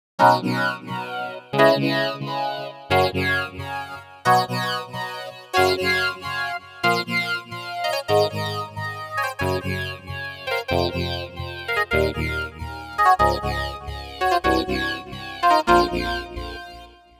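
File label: square formant vocoder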